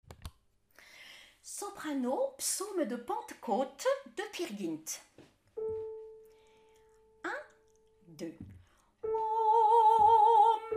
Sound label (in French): Psaume Pentecote Soprano